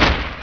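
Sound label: grunt machine gun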